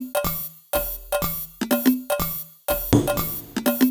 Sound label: Top D 123bpm, House Drumloops; Chickenfarm; Zero-G House Fabrik Drumloops